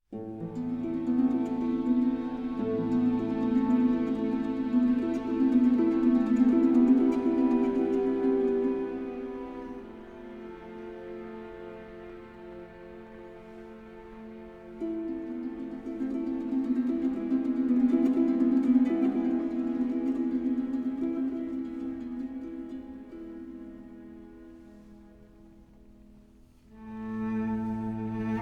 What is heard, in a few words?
string orchestra nocturne